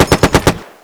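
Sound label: m3 grease gun 3 short burst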